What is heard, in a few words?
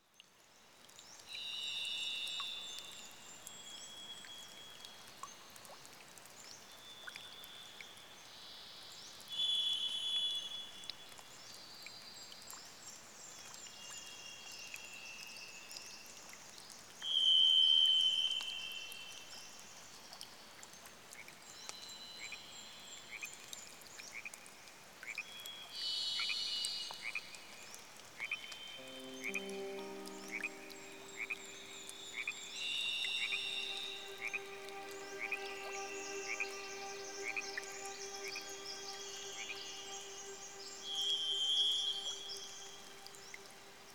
Pacific Rainforest; Dan Gibson